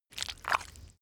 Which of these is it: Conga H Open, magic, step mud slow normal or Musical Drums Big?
step mud slow normal